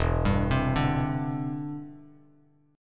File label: E Minor 7th Sharp 5th, down, Bass Standard; arpeggiated